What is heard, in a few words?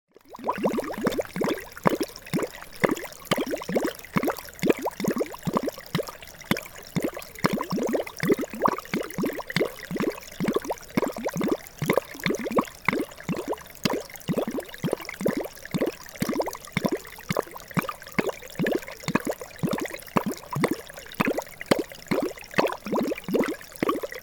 gurgling waters, gentle fire